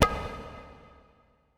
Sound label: E TABSLP #G, ETH DRUMS